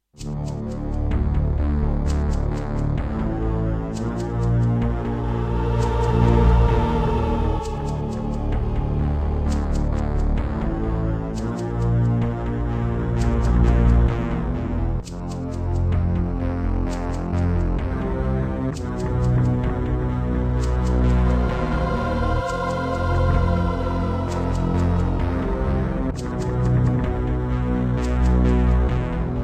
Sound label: Briefing [Gravis UltraSound 1MB Rev.3.4]; Descent II